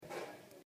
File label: Electrified Environment